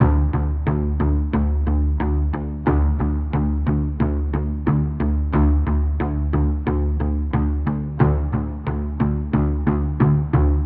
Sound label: Loop Guitar Pulse 90 D